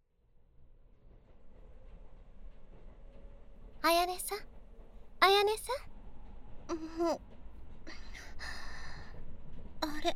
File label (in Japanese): 両耳舐め, ASMR; 上級生